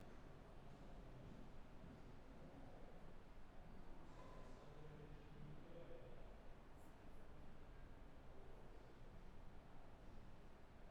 Rumore Fondo; Bedrock-Omni

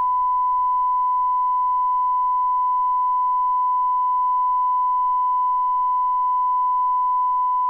Calib altoparlante 1m

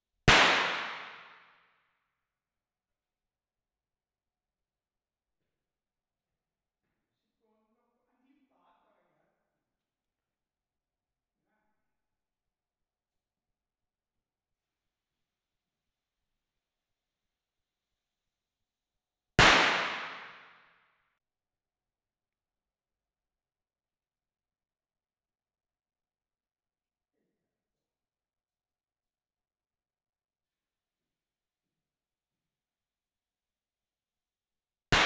Petardi, Binaural; Zoom-H1

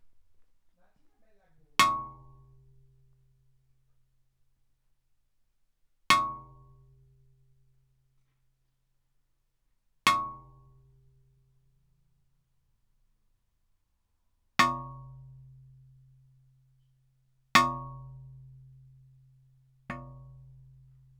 martellate sul vetro; magnetostrittivi; dml-vetro

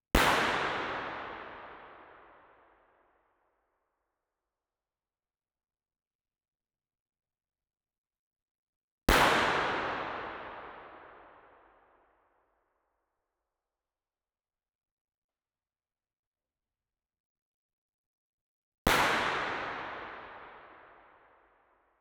Spari Binaurali; S-Ludovico; ComunePR

